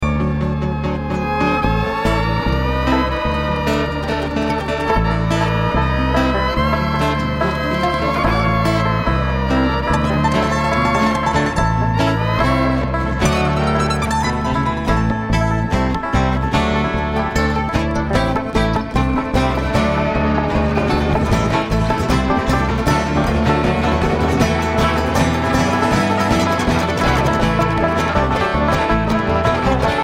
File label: Traffic Jam